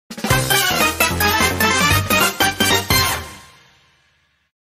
Course Clear; Super Mario Bros. A Plumber's Journey

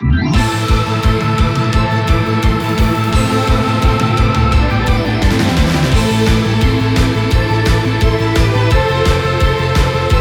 Battle; android

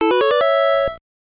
EXP Level Up Jingle; Mighty Final Fight; NES

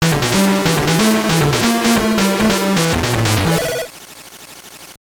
Jingle; NASCAR 2000; gbc

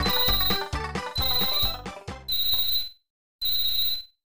Hurry Up! Jingle; crayon shin chan nagagutsu dobon; snes

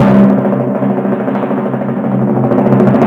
Drum

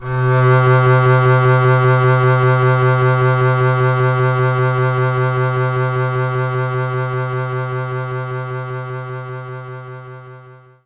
Double Bass C3

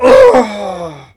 death; sly

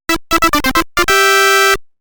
Player Extend BGM; TwinBee; arcade; Lv Change to 1

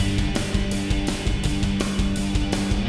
Boss Fight Phase 2 MIXED

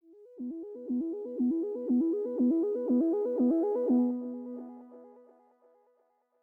Music Intro; sayonara wild hearts 2019 gamerip; Mine

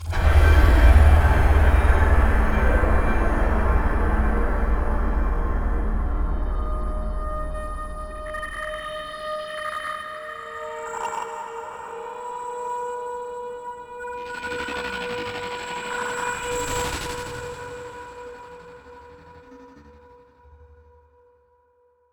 Stinger Open Door Slums; dying light gamerip 2015

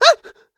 lava vocal; Scrat

